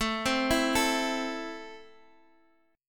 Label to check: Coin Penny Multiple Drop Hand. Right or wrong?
Wrong